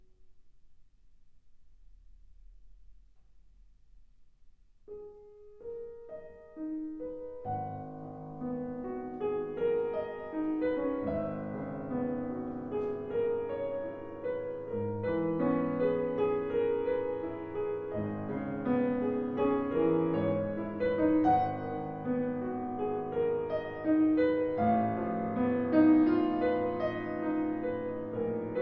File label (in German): Hoerbeispiel Klavier